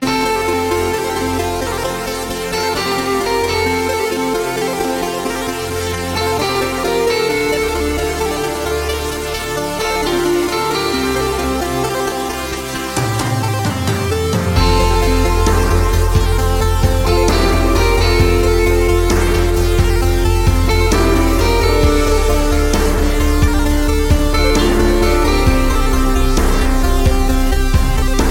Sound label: Game Over